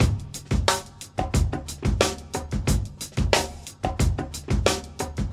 90 bpm drum beat G sharp key, kick tuned to the G# note; drum loops